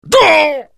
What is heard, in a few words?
pain; kunkka